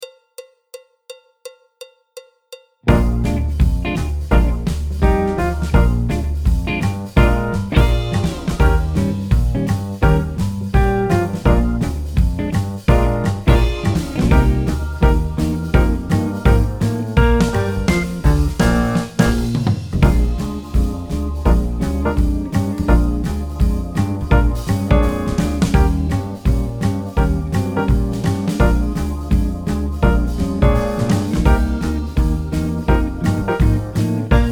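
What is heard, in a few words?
backing track, Jazz; clarinet, tenor; Jazz Syllabus Level 1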